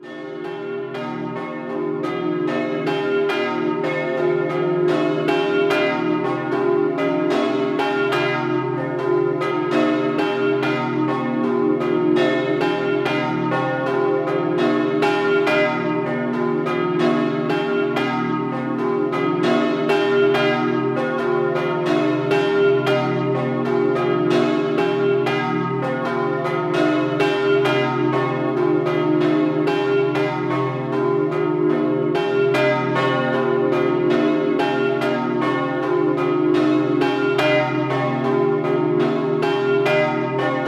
Doppio, campane; Prato Di Resia

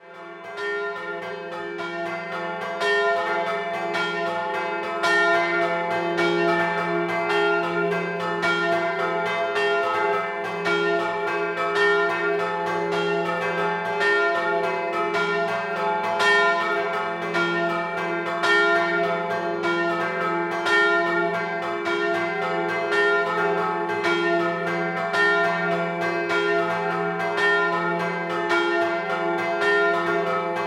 Grions Scampanottata, campane

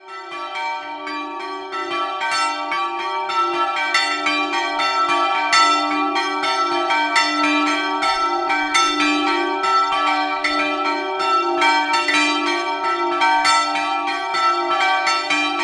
Frisanco Madonna della Stangarda, campane

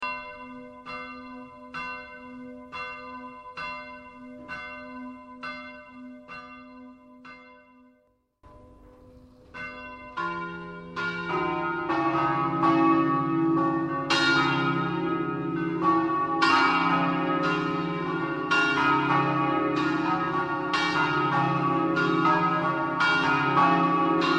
CAMPOLESSI, campane

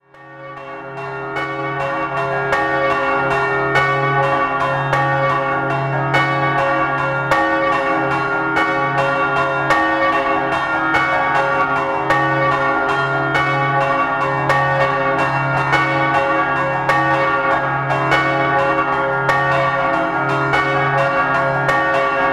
PONTEBBA, campane

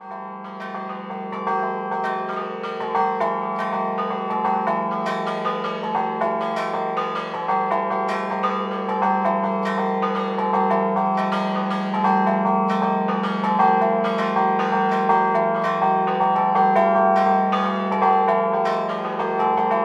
BRISCHIS, campane

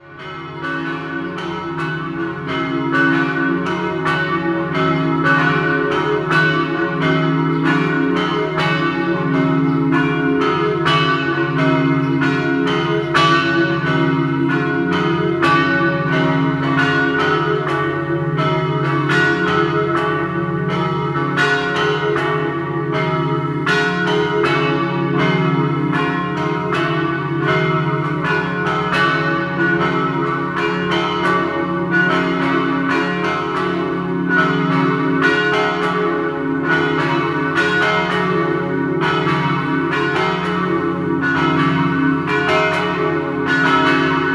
Zoppola, campane

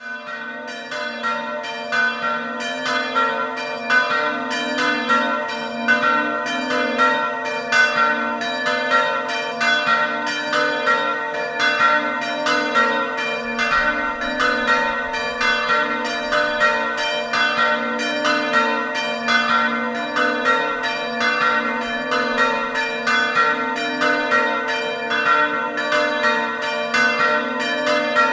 Madonna dello Zucco, campane

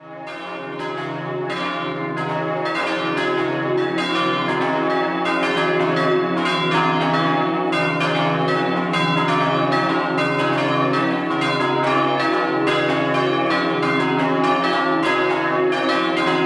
Mossa, campane

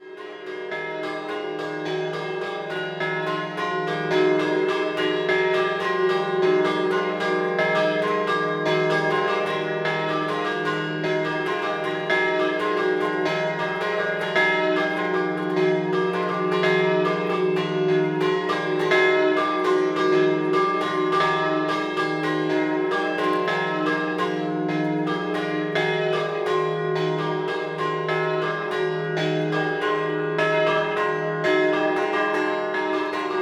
Gradiscutta Scampanottata, campane

